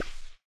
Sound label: death; Epic Toon FX